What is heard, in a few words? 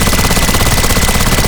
Minigun Fire Loop; Warframe; Corpus; Corpus Railjack; Spec Ops Minigun Agent